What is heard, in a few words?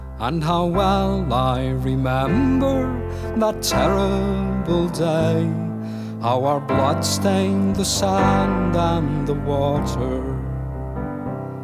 music; wu xing; earth